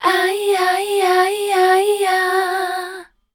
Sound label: MELODIC #F, Voice; Best Service; Voice Spectral II